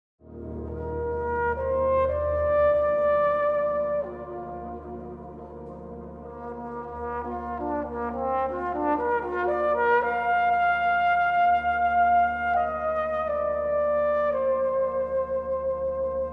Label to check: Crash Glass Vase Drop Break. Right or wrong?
Wrong